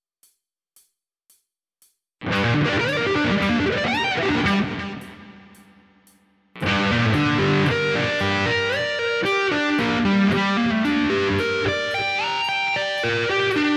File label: Sweep Picking